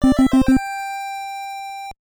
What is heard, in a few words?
Attack Initiated; genghis khan nes